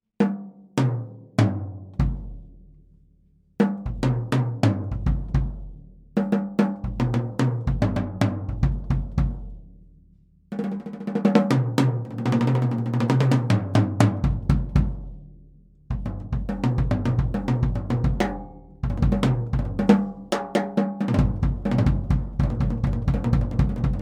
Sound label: Club Date; Ludwig